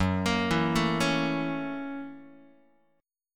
F sharp 7th Sharp 9th, Guitar; arpeggiated; JGuitar

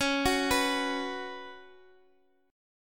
C sharp 7th Suspended 4th C sharp

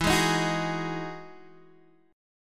E Major 9th E down Guitar Open G

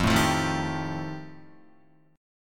F 9th F Guitar, chord; strummed

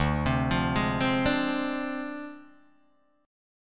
D Minor 7th Flat 5th Guitar DADGAD

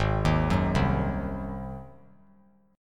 Ab 7th Ab down; Ab7 arpeggiated; JGuitar

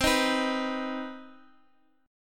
C Minor Major 7th, Guitar, Half Step Down

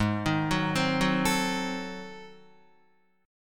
Ab 7th Flat 9th Guitar, chord; Ab7b9 arpeggiated; JGuitar

